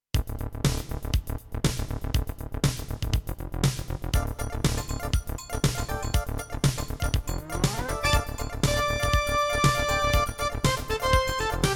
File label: CM500 GS; LSL3